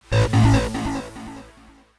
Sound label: dialog error